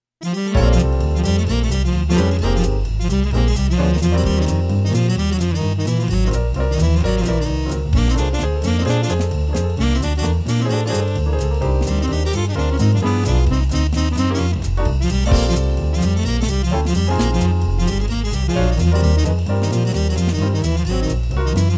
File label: Jazz Band